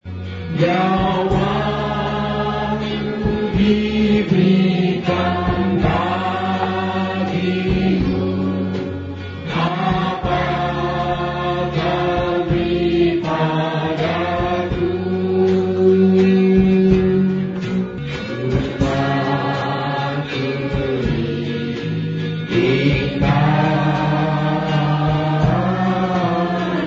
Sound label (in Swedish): long banga kyrkpsalm; borneo